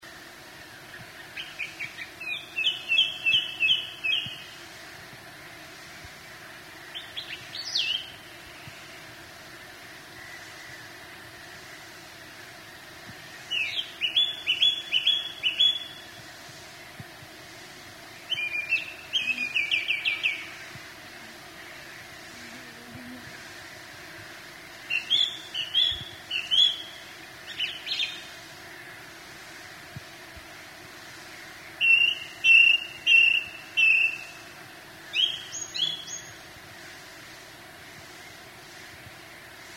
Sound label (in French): Chant de la Grive musicienne